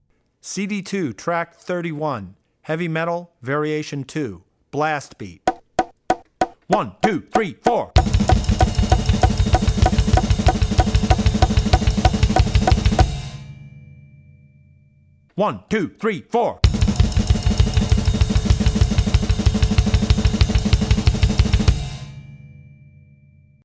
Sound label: Heavy Metal - Blast Beat; CD2 Track31 Metal - Blast Beat; Drummer's Bible Tracks